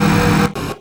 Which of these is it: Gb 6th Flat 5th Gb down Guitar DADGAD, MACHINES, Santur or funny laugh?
MACHINES